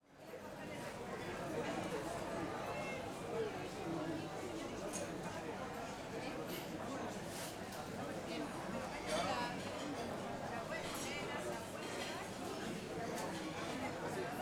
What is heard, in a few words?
madrid food market busy; 3maze; European Ambiances